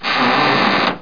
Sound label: door crk